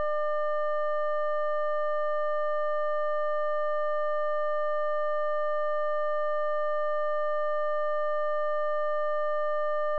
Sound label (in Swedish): sinsin 0600Hz-20dB